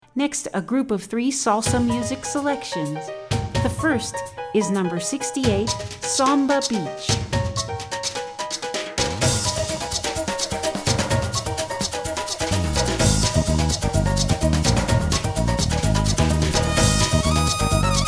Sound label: Samba